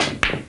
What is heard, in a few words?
pool ball hit; collision; ejss model collision